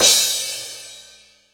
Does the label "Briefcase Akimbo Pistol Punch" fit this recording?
No